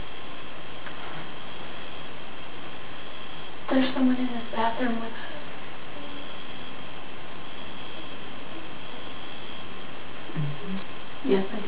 in bathroom child singing or humming

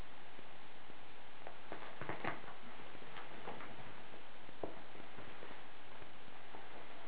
footsteps during quiet time

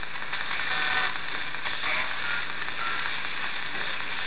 ghost box evp; summit ridge

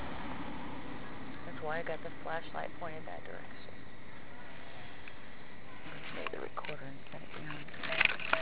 spook light evp

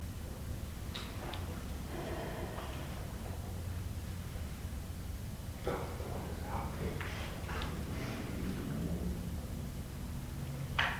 breath then please help me please

